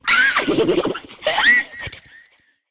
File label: Girl Idle I; Warframe; Zariman; Skitter Girl